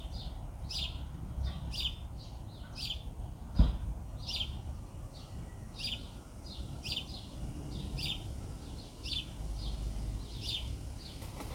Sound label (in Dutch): mus Huismus Passer domesticus; dieren